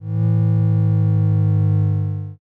ship horn

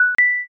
intercom

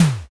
wall punch

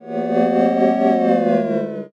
chatter

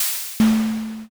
petrify

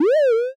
punyon

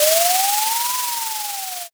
drift

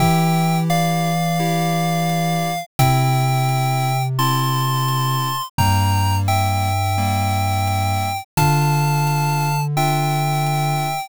bgm mellow

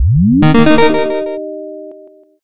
boot

